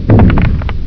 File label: momma step